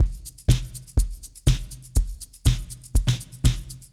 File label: Mayday 122, drums loops; RoBKTA Sample Pack Supreme